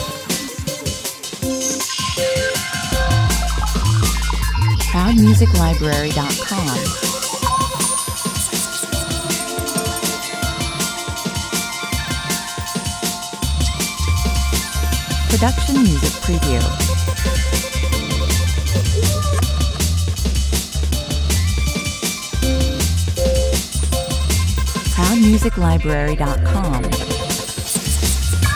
160 bpm, An intense energetic modern break beat track reflecting a busy city during busin; reflecting a busy city during business hours traffic lights crowds of people crossing the str